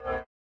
GUI Sharp Activate; the 39 steps

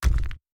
Rock Boulder Hit

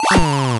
retro damage hurt ouch; Infernal Radiation

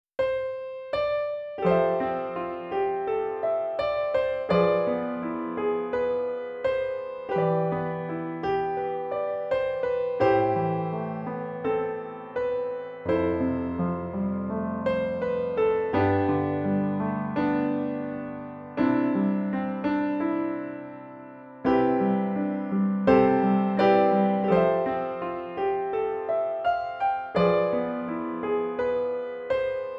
Piano Ver.; Atelier Lydie & Suelle The Alchemists and the Mysterious Paintings Original Soundtrack

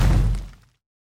Rock Wall Hit

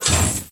Sound Cage Interract; Peace, Death

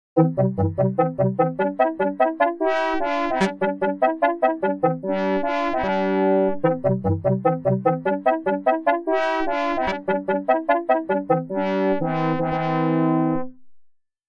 FANFARE (CLEAR); arcade disc in jaleco variety; CHAM'S FANFARE

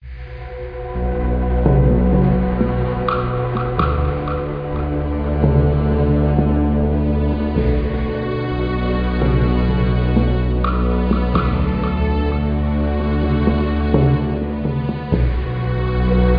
Attic; Safecracker: The Ultimate Puzzle Challenge; NDS

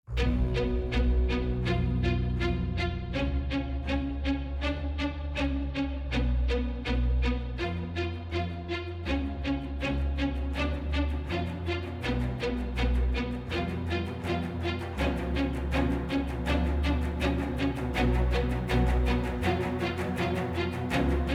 Combat Loop; DLC HarleyPolice

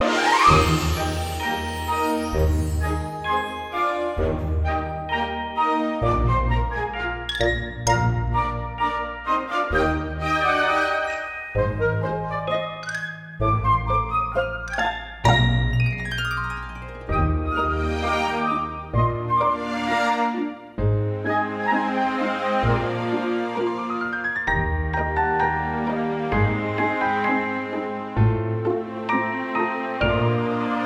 slow dance; My Little Pony Magic Princess; arena

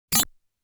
Enemy Damage Sound; Zero Wing

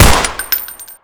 MP5; BONES

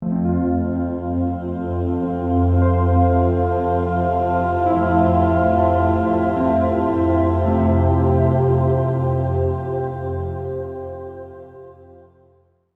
Mod Calm; Sid Meier's Civilization Revolution 2; vita

